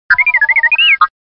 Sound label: r2d2 beeps